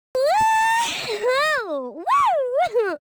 Woman Dance Happy; Zomboid; Lifestyle

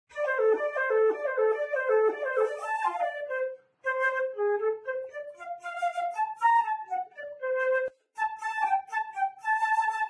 Flute; Zomboid; Lifestyle

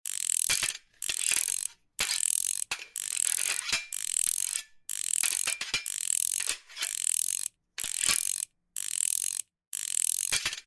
wrench; Zomboid; DIY VehicleParts

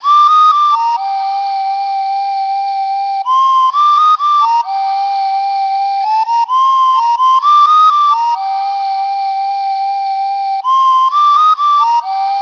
flute; Zomboid; EntertainYourself

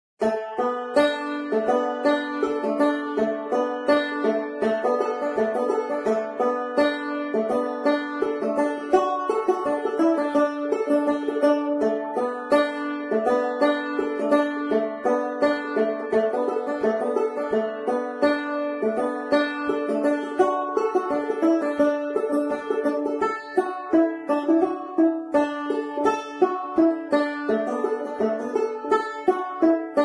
Banjo; Zomboid; Lifestyle

